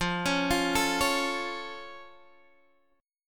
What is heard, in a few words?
F Augmented F Guitar Standard; 13,16,15,14,14,x; arpeggiated; capo=3